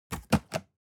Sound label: object box cardboard close fold scrape short